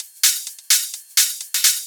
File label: Hihat Loop 128 BPM